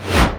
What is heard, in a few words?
fast whoosh